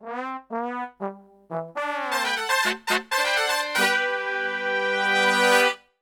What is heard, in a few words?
MEX G, Brass, MEXICO LICK; Best Service; Brass Super Section